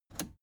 plastic button scratch smooth push enable

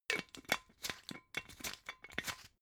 glass bottle massive close scratch

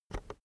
keyboard external enter push soft

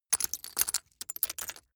glass debris fall sequence short

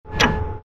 metallic impact

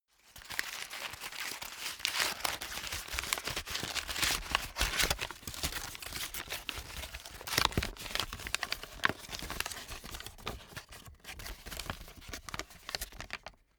sheet flatten

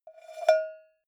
cowbell scratch hit soft

